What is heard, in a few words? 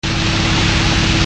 Freight Yard; Machines